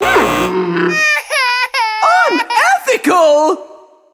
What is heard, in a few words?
sobbing plus Unethical!; Running Man Jungle; genki